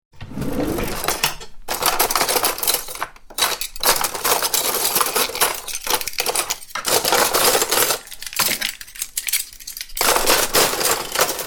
Cuttlery Drawers

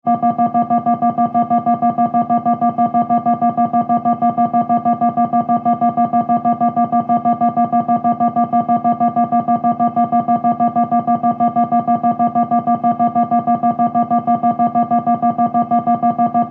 Science Fiction Alarm Beeps; Alarm Sound Effects; Alarms (Sound Effects:Electronics); SFX Bible 6

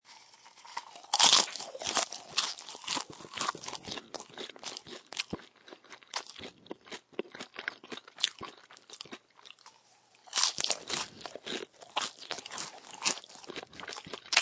Eating Popcorn, Eating popcorn chewing sounds; Human

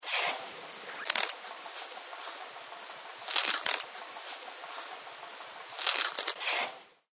Elephant Eating; Elephants; Animals; Partners In Rhyme

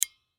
Switch Lamp Knob, Switch Lamp Knob Turn Once; Switches; Foley; Objects; Blastwave

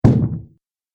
Body Slam Impact, wrestling and struggling

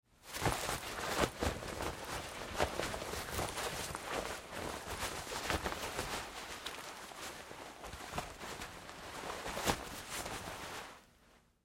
Foley Fight Moves Nylon Shorts Struggling; Wrestling And Struggling Sound Effects; Big Room Sound

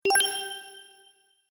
Magic Idea; Magic Sound Effects

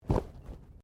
Bodymovement Fall Light

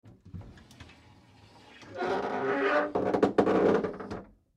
Household Bathroom Closet Doors Close Slow Creaks; Blastwave

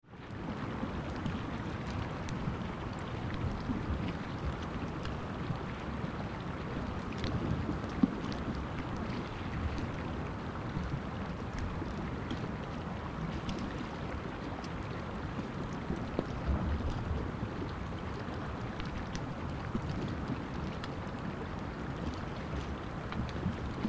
Ocean Sea Semi Frozen with Ice Pack Moves; Water moves between ice sheets; Ocean Sound Effects

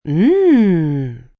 Mmm Pleasure, female; Exclamation

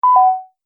Multimedia Event Notification, beep sound effect

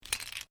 AEROSOLE SPRAY CAN MOVE

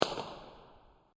Far Away Firecracker Bang; Fourth of July

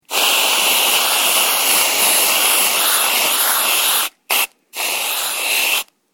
Spraying A Can Of Squirty Cream; foley

